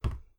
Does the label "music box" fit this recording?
No